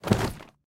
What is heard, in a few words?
library furniture books